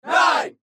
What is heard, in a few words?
Crowd